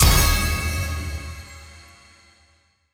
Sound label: small win alt